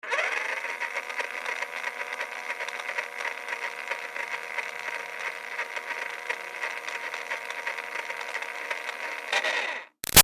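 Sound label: Toy Bounce Back Racer Medium Far Run Long; Toy Cars; Toys; BigRoomSound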